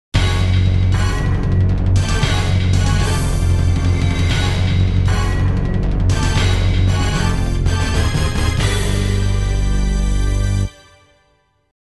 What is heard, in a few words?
Victory; Mortal Kombat 3; arcade